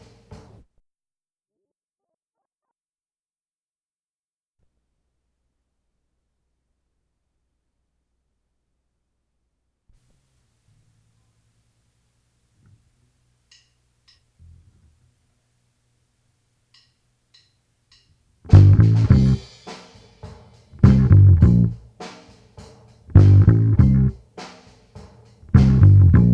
bass

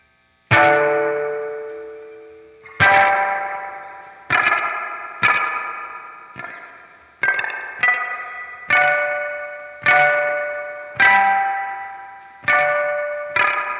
guitar volume swell

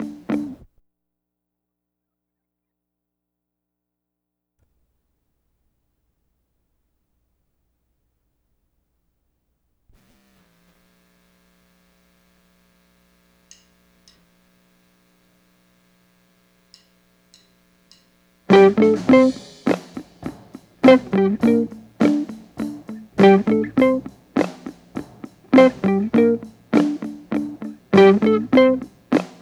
guitar

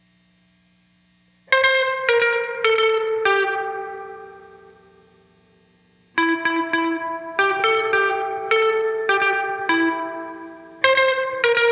guitar overdub; guitar overdub gareth

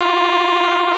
sax

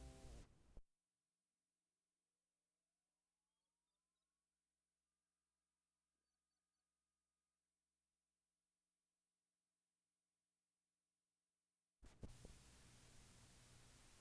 melodica

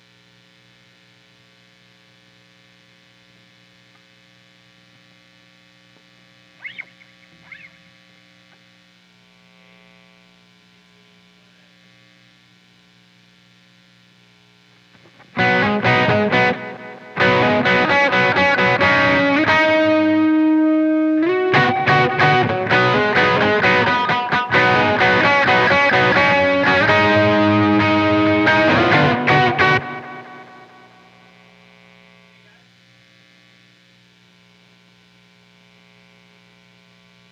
guitar

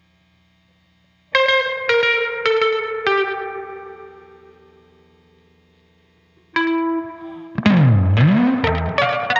guitar overdub; guitar overdub gareth

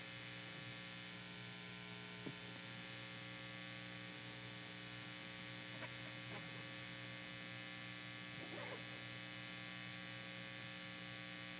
guitar; greg new guitar

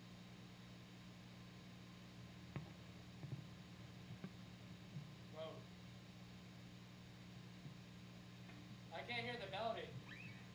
bass slide

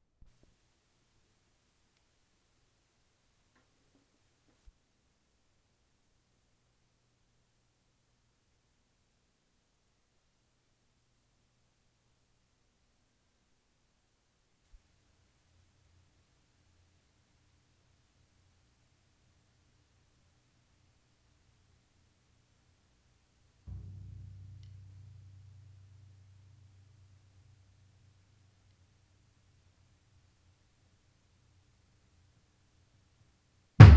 TYMPANI

